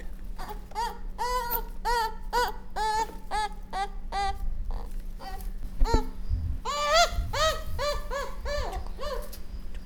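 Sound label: Castor fiber juvenile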